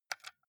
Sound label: console controller xbox home press